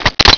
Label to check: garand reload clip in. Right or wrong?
Right